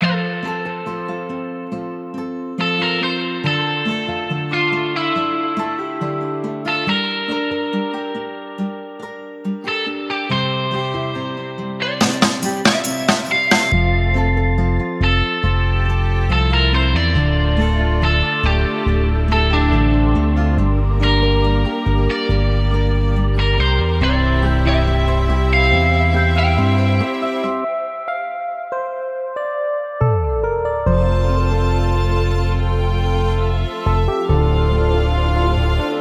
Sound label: love no drum; by burak sound bank; dramatic dram vol 19